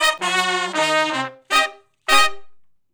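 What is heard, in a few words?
R&B Riff (Eb) unison, harmony; Sections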